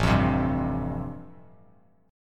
A-Minor 7th Sharp 5th, Bass Standard, chord; Am7#5 strummed; 5,8,10,0; JGuitar

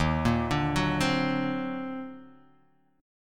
Eb Minor Major 13th, down; arpeggiated; chord; JGuitar

